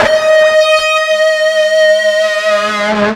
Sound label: FUZZ D#4, Guitar; East West; Steve Stevens Guitar Samples Collection